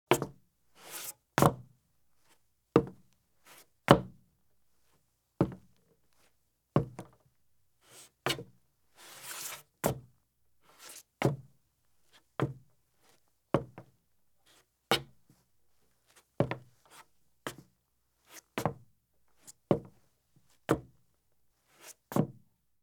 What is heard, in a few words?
Men's Business Shoes Make Various Scuffs on Wood Porch or Footbridge; Sound Ideas; Footstep Sound Effects